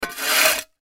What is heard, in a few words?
Shovel Scrape Dirt Concrete Dig; Yard Tools; INDUSTRY